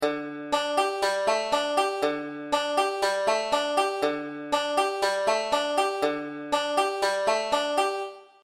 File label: d7sus4 w hammer 3 finger, banjo; beginning 5 string